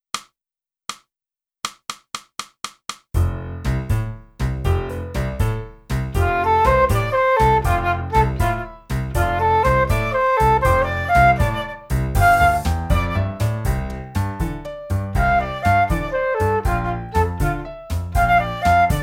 flute; Boosey Woodwind Method: Flute (Book 1)

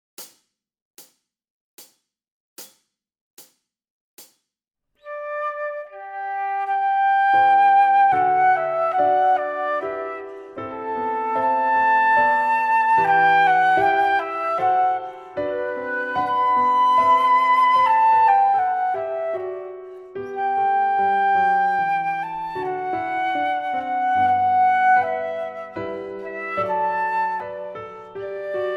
Larghetto Full Flute

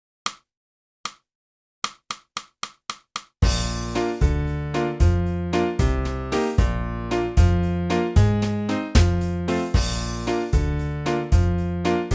sax

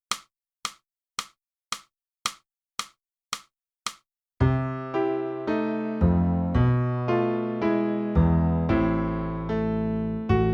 flute

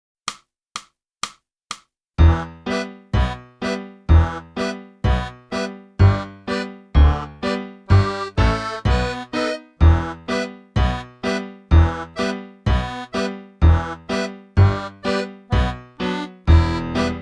flute; Boosey Woodwind Method: Flute (Book 1)

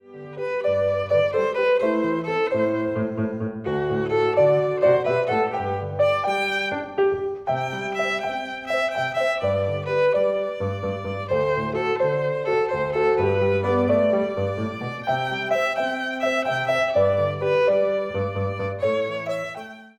VIOLIN

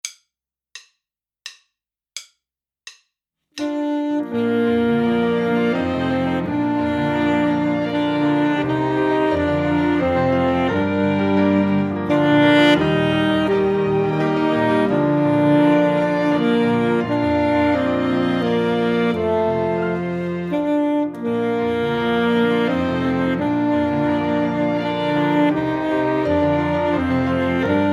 Duo Student Sax

